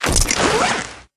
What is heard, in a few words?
armor; player